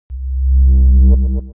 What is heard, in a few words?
low frequenced signal; game fx; smartsoundfx